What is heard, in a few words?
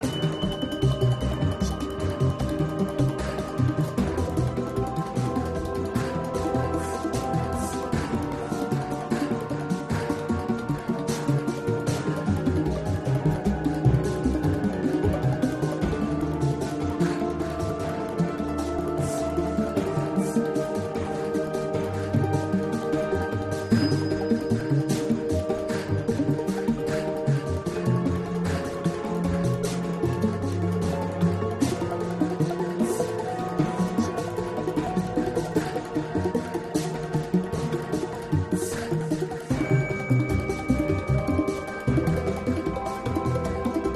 loop Action